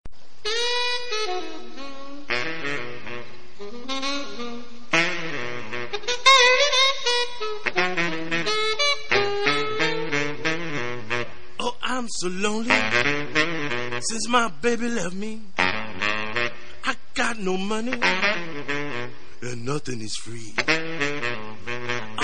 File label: blues